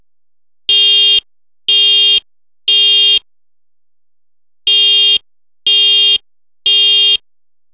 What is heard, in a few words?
fire alarm system standard temporal three